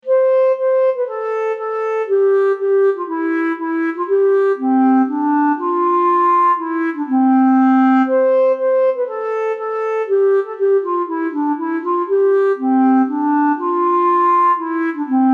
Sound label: Bb Clarinet; Country Gardens